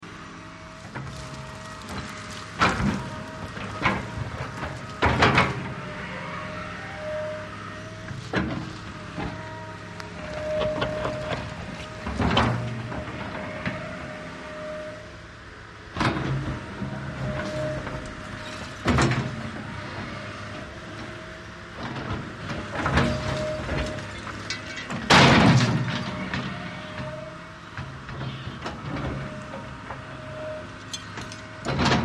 VEHICLE - CONSTRUCTION JOHN DEERE 750: EXT: Idling, clearing debris, close to bucket, debris impacts and dumping.; 750 Dozer